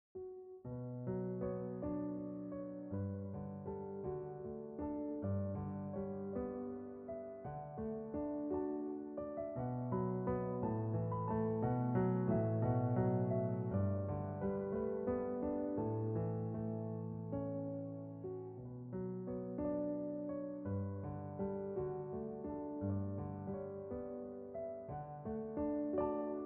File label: Waltz Irish Traditional; Keegans Waltz